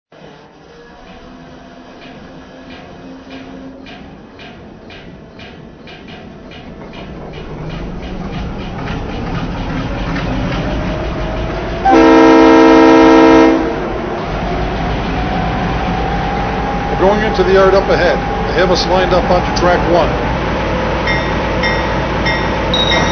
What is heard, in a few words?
GE U25B